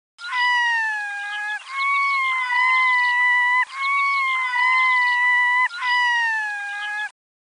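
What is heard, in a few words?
red-throated loon voice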